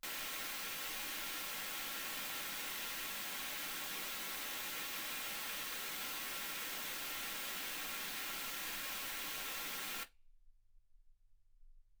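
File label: white gaussian noise 48kHz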